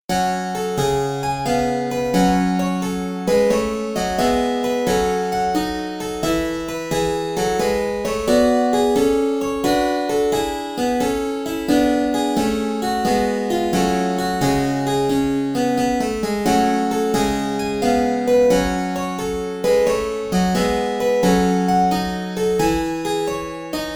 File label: d scarlatti k008 pythagorean